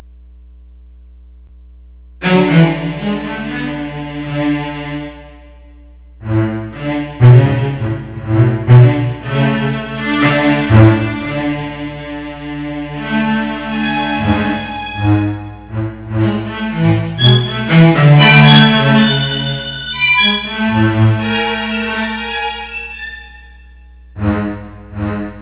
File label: quartet